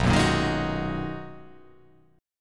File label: B Major 13th B down